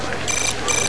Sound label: chirp, Computer Chimes and Chirps; Babylon 5